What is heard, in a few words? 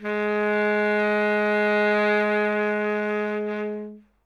notes g sharp, sax; Dirt-Samples